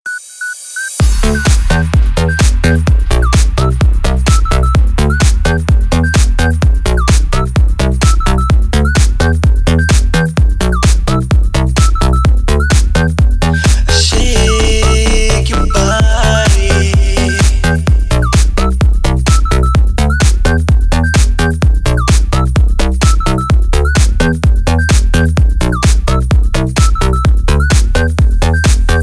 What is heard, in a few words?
Super Dance Beats